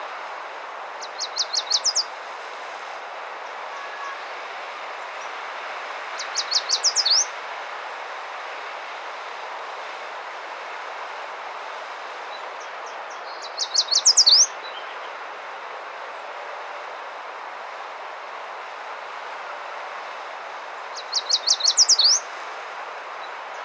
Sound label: American Yellow Warbler Setophaga aestiva